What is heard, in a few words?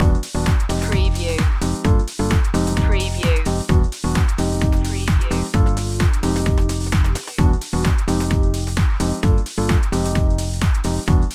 house loop